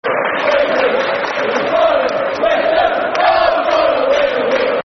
We Love You Boro Football Chants Soccer Songs, middlesbrough chants; Middlesbrough Mfc